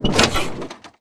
snowmobile suspension light; Call of Duty Modern Warfare

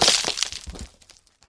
tunneler worm dig down

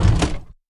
FW-190D9 canopy open end, aircraft; DCS

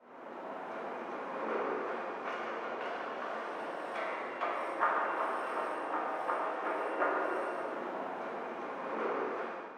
ambient port; World Of Warships; clanbase

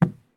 run wood; Escape from Tarkov

